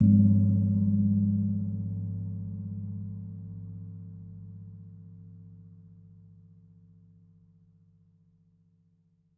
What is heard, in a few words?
Kempul, Gamelan